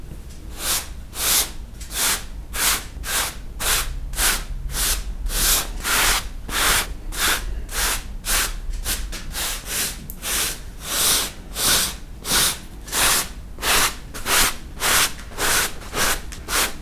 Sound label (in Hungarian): zako kefeles balrol, ruhakefe; angyalfold